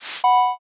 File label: transition